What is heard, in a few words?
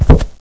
carpet; valve